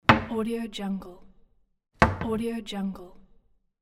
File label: kitchen cabinet